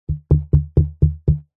foley cardboard box small knocking on side dull thuds